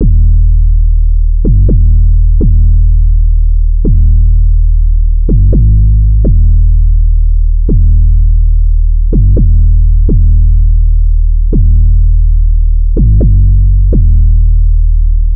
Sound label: 808 loop 125 Ebm; Slate Digital BASSHOLE Sample Pack; 808 Loops